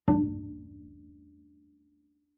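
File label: Bass pizzicato fortissimo sul D D2, Double Bass; MIS Pitches - 2014; Strings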